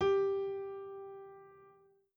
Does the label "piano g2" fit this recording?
Yes